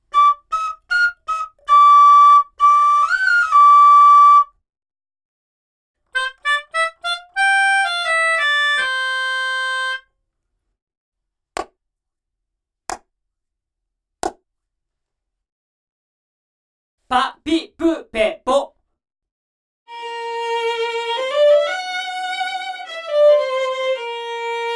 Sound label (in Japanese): アイソレーション・ブース：Studio 3, 非常に狭く、親密で、耳元で囁くような距離感; ボーカル録りに多用したブース。非常に狭く、親密で、耳元で囁くような距離感を演出できます。